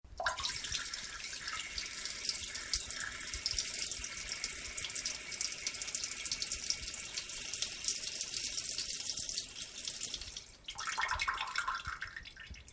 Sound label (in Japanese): しょばちゃちゃおしっこ